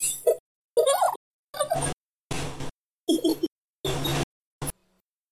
Little evil laugh

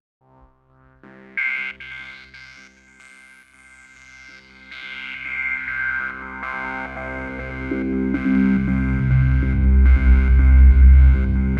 Acid arpy bass

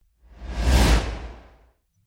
Smooth Whoosh

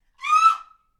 Female Scream Owi

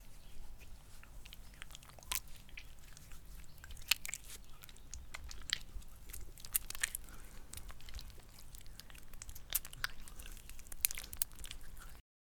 Playing With Slime

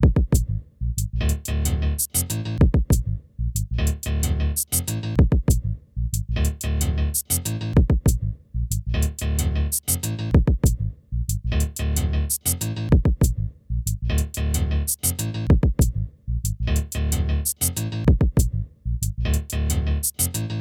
Dope Beat

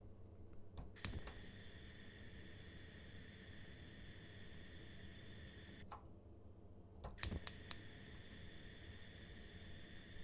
Gas Hob

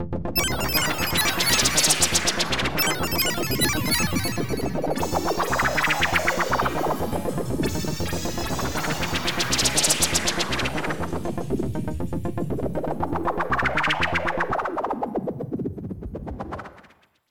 Space Intro

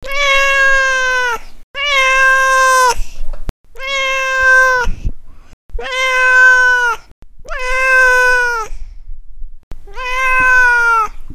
Cat Meows For Food

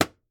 Balloons Popping

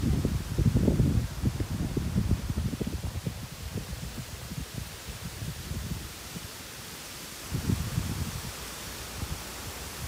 Wind Before Rain

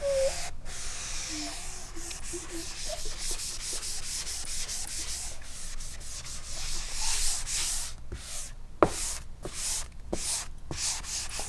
Eraser

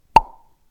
Pop

